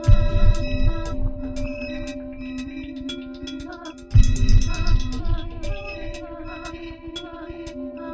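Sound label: 140 bpm abstract ghost melody; SAMPLED MUSIC LOOPS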